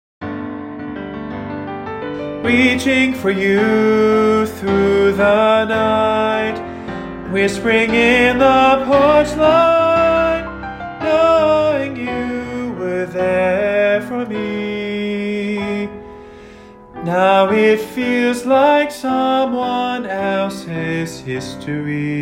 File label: Resonance Example